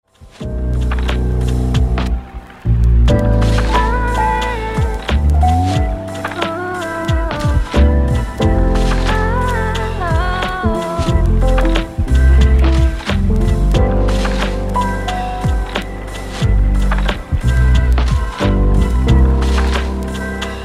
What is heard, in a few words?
rainy afternoon